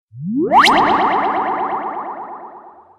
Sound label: Buzzer Sms